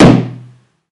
Boss Explosion; multiponk

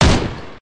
Rifle; MilkChoco

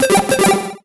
Solo Chip Arp Positive; MUSIC EFFECT; startide

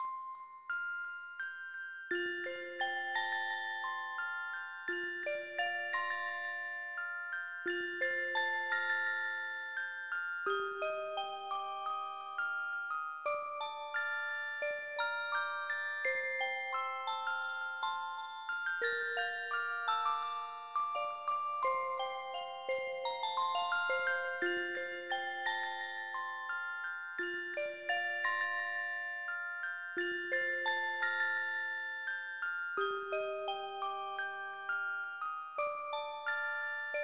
MusicBox; My Merry May; ps2

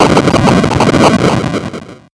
Stamp; BOMBERGIRL RAINBOW; Arcade

